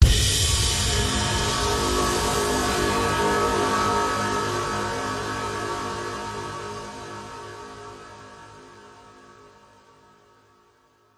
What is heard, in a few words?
horror hit ghostly haunted evil sinister menacing grinding